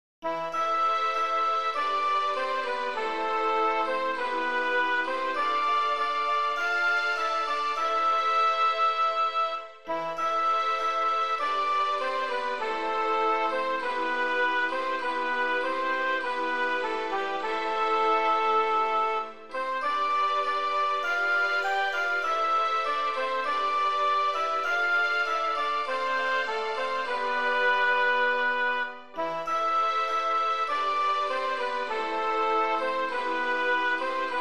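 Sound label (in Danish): melodi uden tekst, middelalder og middelalderinspirerede sange og musik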